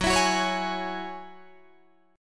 F sharp 7th Suspended 2nd F sharp down Guitar DADGAD